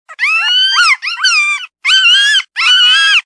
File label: Canine Puppies